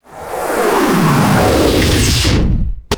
futuristic sci fi warp whoosh and impact; swooshes

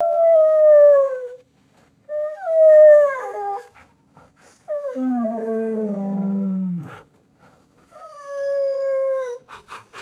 high pitched wolf whines and groans; animals

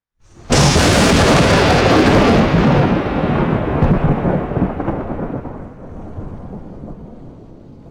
loud thunder crash and rolling rumble; weather

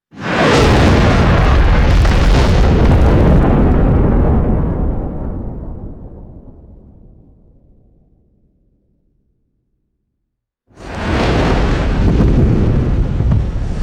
heavy thunderous explosive whooshes; swooshes

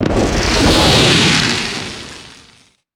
stylized lightning strike and crackling whoosh

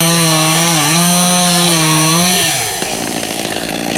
gas chainsaw revving and cutting wood

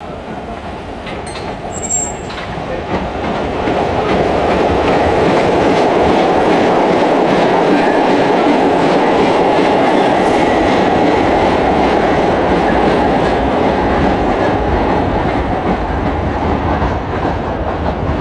elevated train accelerating and fading away; trains

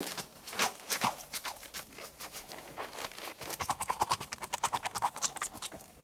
wolf shaking fur scratching and licking; animals

